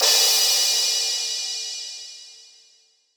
Crash, Cymbal; Ableton Live 12 Lite; Core Library; Drums

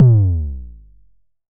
Tom Fat; Drums

